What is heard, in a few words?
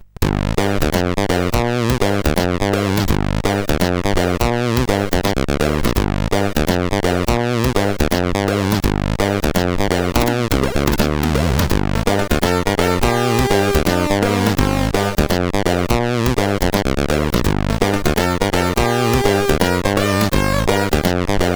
Music sid CSG8580R5